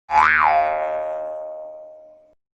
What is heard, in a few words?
JUMP SOUND